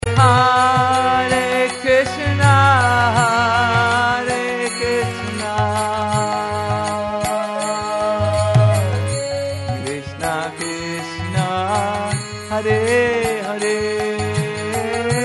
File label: Radha Raman melody